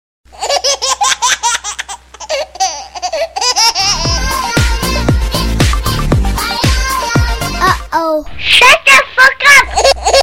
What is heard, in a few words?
baby rap remix